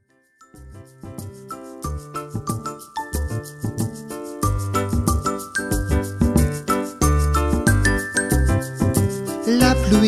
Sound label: La Pluie Maracas